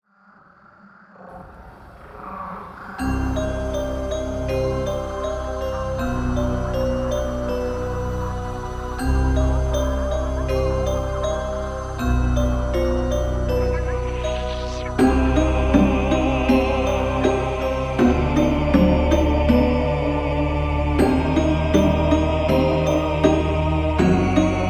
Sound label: intro music; winchester